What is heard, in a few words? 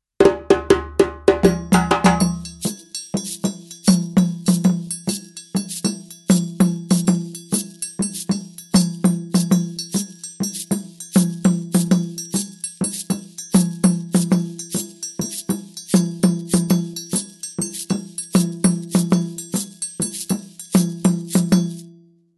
denadon sangban, rhythms